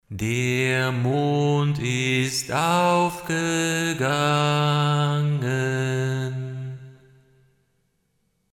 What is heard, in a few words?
midi lead; tc helicon perform ve